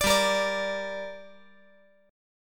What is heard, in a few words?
G Minor G down, chord